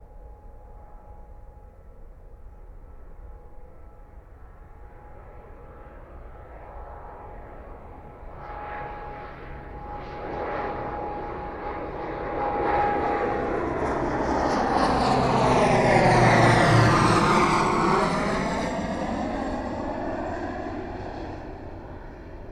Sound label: Medium Sized Propellor Plane